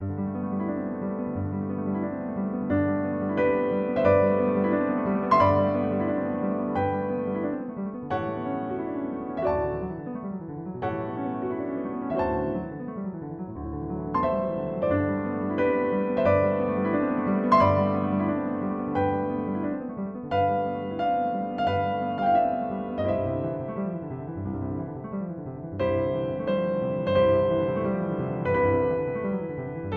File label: Yamaha concert vivid